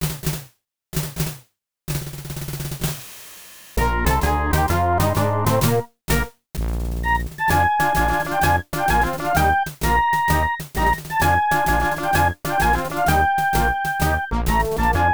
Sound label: March; galaga 88 namco system 1 arcade